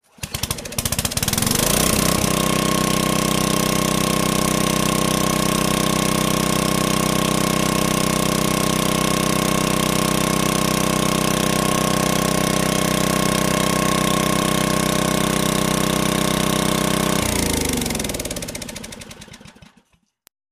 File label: SMALL GAS ENGINE; engines small